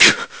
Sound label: Knocked; Byrne; zelda